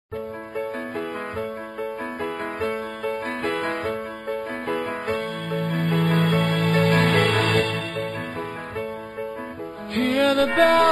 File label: easter song